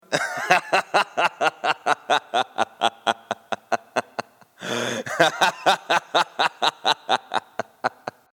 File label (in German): klingelton